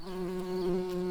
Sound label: bumblebees, Hypnorum workers